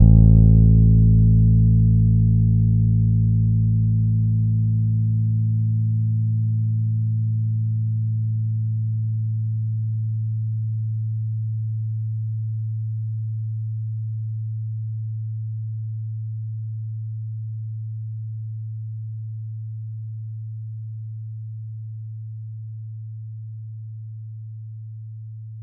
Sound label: As A2 soft, Bass, Pedulla Thunderbass; Giga Samples Collection